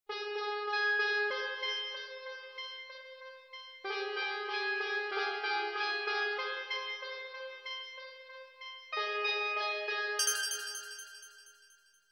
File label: 189 BPM A♭ major